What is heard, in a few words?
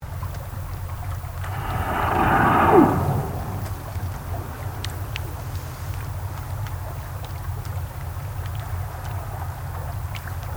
Short whistle breath